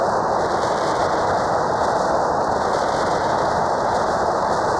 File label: Cracklature, sparse soundscape